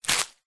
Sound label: foley footstep single soft shoe forest floor dead leaves twigs; zapsplat